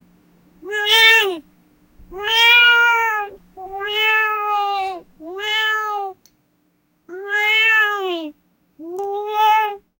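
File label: male cat proposing to female, cats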